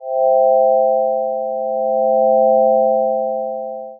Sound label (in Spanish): alarm amanecer